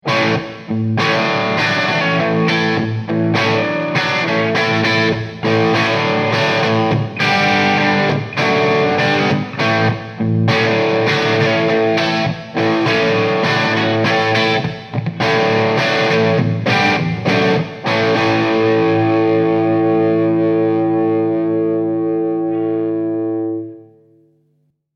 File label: Bright Hall